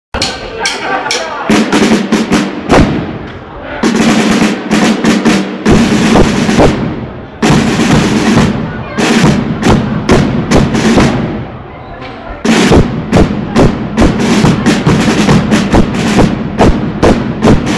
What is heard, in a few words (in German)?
Trommler solo